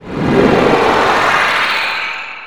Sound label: abyss